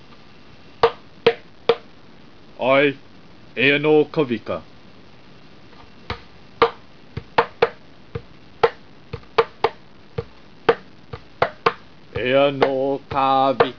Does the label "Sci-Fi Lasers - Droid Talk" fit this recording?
No